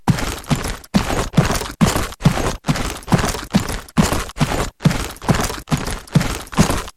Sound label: move; Terrorblade